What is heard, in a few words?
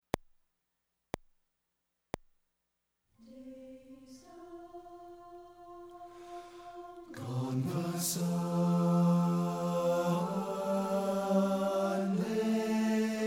bass; Taps